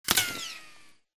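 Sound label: bullet light ballistic titan ricochet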